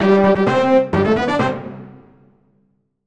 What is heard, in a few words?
Victory Fanfare, MIDI; 7th Saga